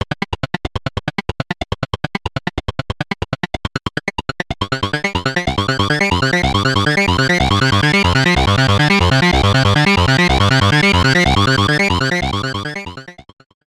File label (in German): Sequenz mit minimalem Decay; Behringer K-2 MK 2